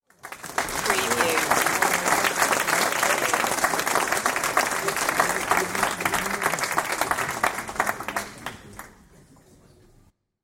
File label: Audience Applause; beanstalk audio